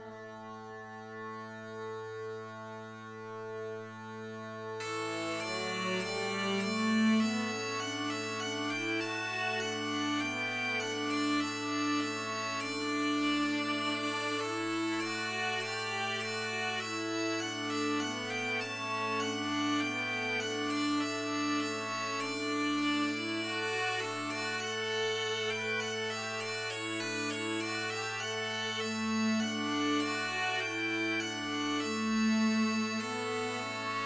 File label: viola smallpipes midi; fantasia on forest green